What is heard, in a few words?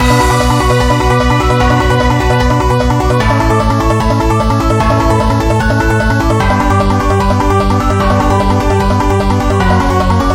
Drummy